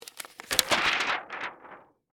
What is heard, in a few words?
Foley, Gel, Unroll, Cellophane, Open, Color, Correction; Crackling